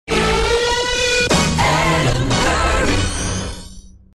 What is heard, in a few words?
jingle